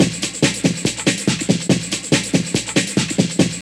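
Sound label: drum loop; X-Static Goldmine 3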